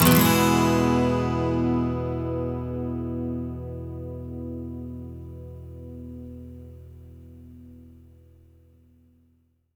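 12 string major E, Electric & Acoustic Guitar Loops; USB Soundscan vol.04; AKAI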